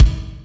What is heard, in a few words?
KGT1, Korg-T1, Drums